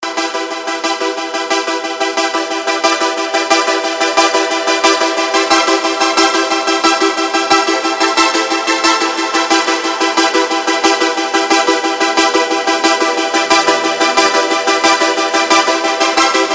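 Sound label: Arpeggio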